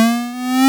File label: loop